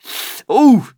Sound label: Sfx Campus